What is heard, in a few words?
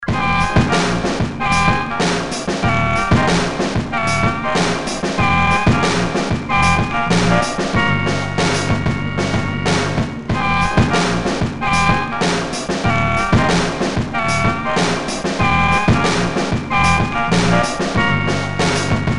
Organ Jam